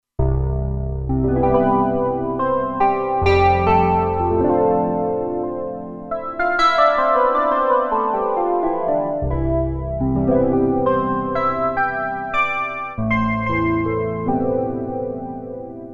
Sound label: Piano 2, Roland Alpha Juno-2; Original Sound Chart patches